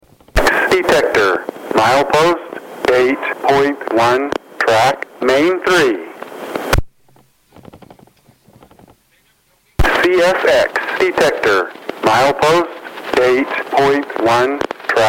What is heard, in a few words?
approach with DD audio; Laurel